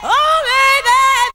VOC

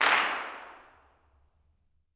CLAPS, Gospel; Spectrasonics; Vocal Planet Vol.1 Gospel; akai

